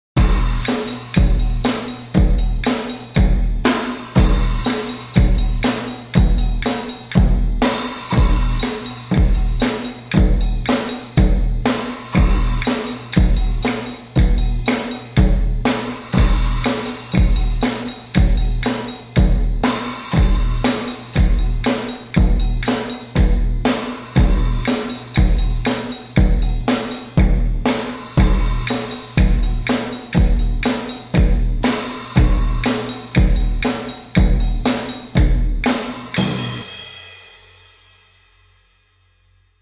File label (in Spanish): Rocksoul batería